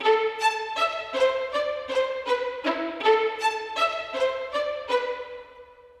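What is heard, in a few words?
Probing Pad; Сэмпл скрипки для фильмов: Violins spicc; film music; Strings; Музыка для фильмов; скрипка; Movie Score; 80BPM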